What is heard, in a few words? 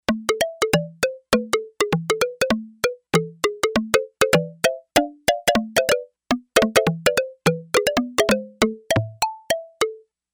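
Bamboo Block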